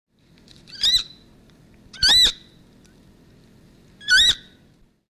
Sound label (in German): Rehkitz Rufe